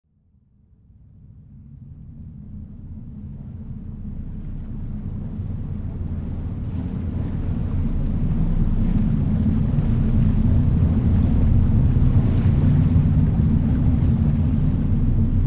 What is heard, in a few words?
Submarine sails underwater